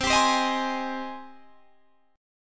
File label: Ab Major 7th, C